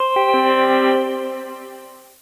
Sfx Reputation Reset

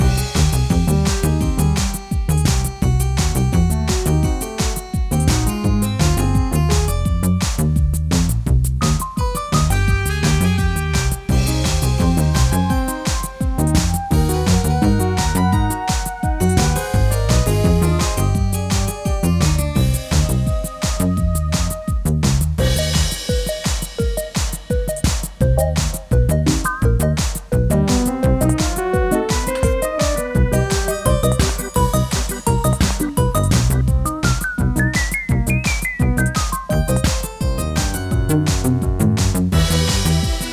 Mus Coop Generic Combat Med

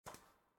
Footsteps Constellation Land; Main Character

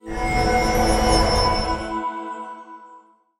Story Item; ascendance

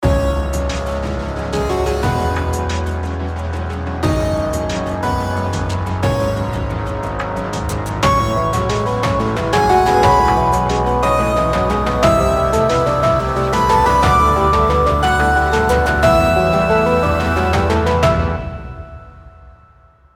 bgm Ship Stolen; Robo's World The Zarnok Fortress

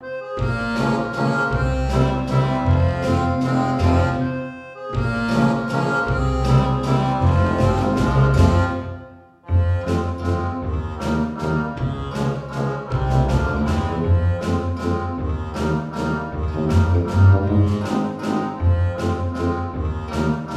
Tavern Downbeat Inside; Sogport

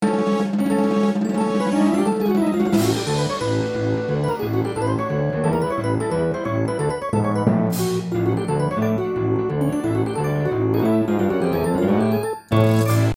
perform artist music; The Sims 3; windows gamerip 2009

